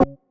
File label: button